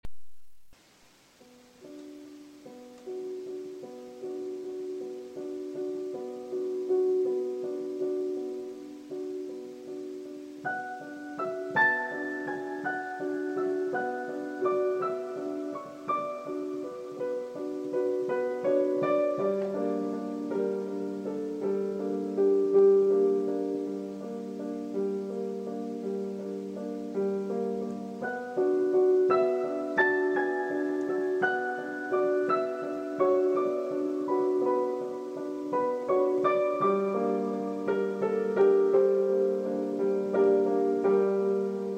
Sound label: Piano Free Style